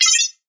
crossbow beep; weapons

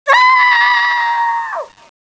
falling

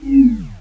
patrol drone power off, weapons

